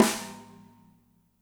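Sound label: snare left; Spectrasonics; Burning Grooves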